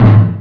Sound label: Collision